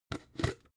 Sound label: jar glass plastic open cap